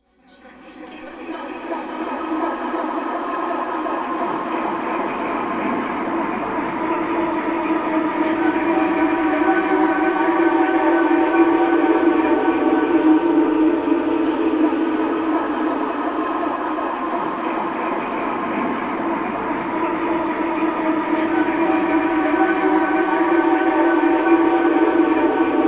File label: Applause; Dobby